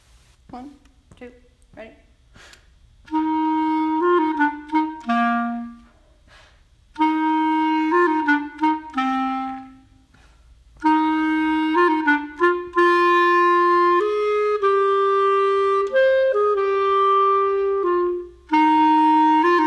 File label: bass clar